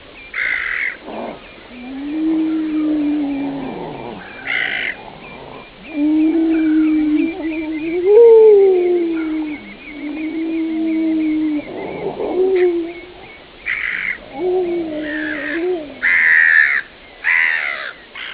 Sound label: jungle